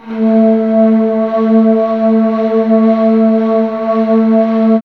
BAMBOO

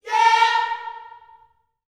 "yeah", gospel vocal, A#4; Spectrasonics; Vocal Planet Vol.1 Gospel; akai

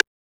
RIM

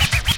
SCRAT; E-Lab; X Static Goldmine 2; akai

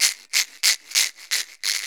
FX, CROWD FX; E-Lab; X Static Goldmine 2; akai

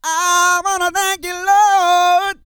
GOSPEL

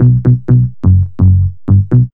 B LOOP

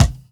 BD 70 S; E-Lab; X Static Goldmine 2; akai

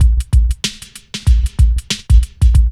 LOOP, DRUM; E-Lab; X Static Goldmine 2

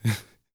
LAUGH, Vocal Jazz Fx

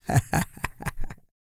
LAUGH, Vocal Jazz Fx

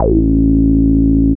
BASS

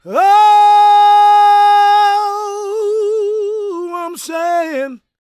GOSP MALE, Vocal Planet Gospel

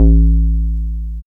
BASS; E-Lab; X Static Goldmine 1

